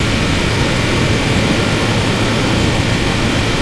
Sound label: engine; Star Wars Knights Of The Old Republic II; XBOX